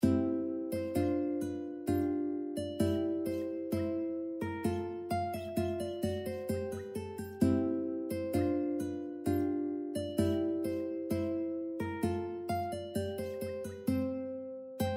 uke andante mozart

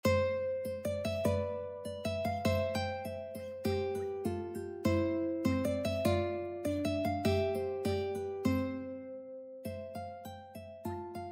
duet ukulele sonatina; Sonatina by William Duncombe; classical ukulele fingerpicking duets

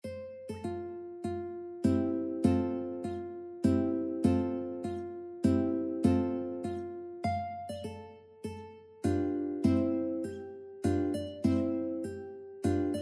ukebook scherzo; gurlitt

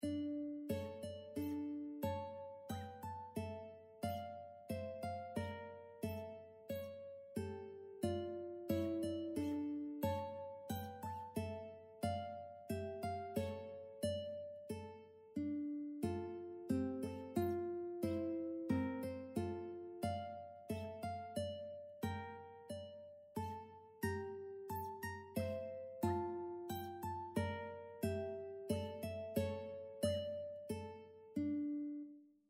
duet ukulele air; Air by Henry Purcell; classical ukulele fingerpicking duets

